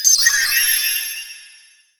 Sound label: hp recovery magic